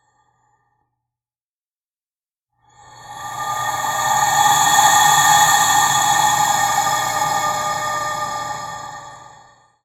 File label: Andean Wind Flute; Sonic Foundry (Sony Creative Software); Continental Drift Aftershocks